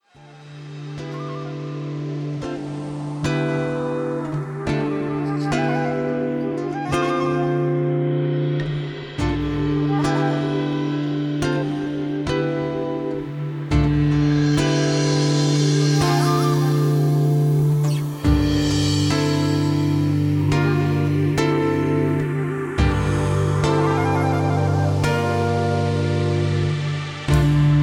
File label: Relaxing Guitar